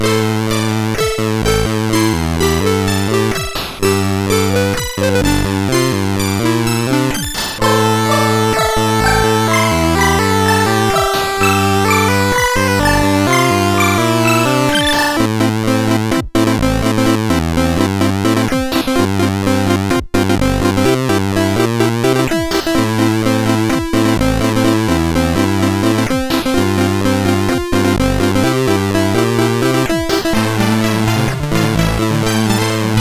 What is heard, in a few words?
Nice Tune sid CSG8580R5